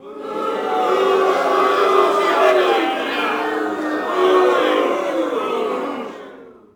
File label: BMX Crowd Boo; Crowds